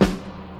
low tone snare b key

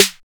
original snare one shot g key

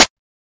old school small reverb snare sample g sharp

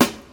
treble heavy acoustic snare g sharp key; snare drum samples

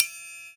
verby ride single hit d key

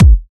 raw kickdrum sample g sharp key

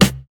studio snare drum sample c key

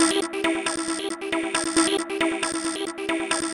techno synth sequence 136; synth loops

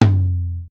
subtle reverb tom single hit f key; tom drum samples

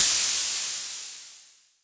urban crash sound A Key; cymbal drum samples

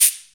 shaker sound sample d key, percussion

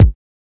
old school reverb bass drum g sharp key

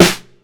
dirty snare drum sound f key; snare-drum-samples

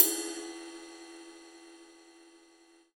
big drum crash e key; Cymbal; drum sample; one shot

